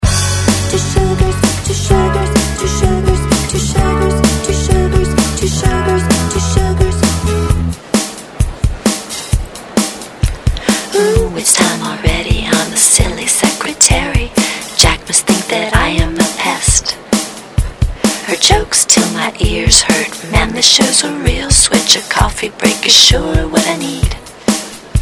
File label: bubble gum coffee song